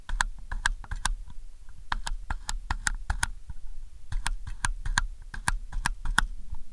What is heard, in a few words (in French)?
SELECTION VITESSE MAMIYA RZ67